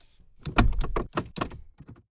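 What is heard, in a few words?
gear shift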